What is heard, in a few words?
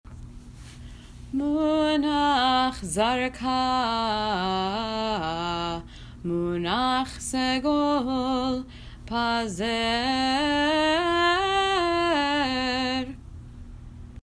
munach zarkah munach segol pazer; Cantillation for Torah; The Jewish Theological Seminary; Munah Zarka Munah Segol Pazer